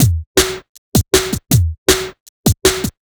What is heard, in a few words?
Classic Beat, Drum N Bass